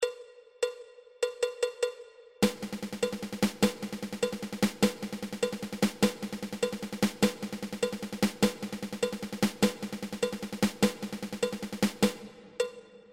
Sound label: Ten Stroke Roll